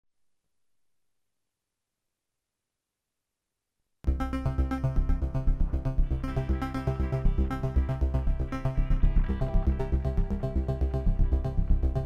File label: micro exploration, keyboards